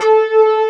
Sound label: chiflead; cubic